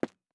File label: Footstep Concrete Running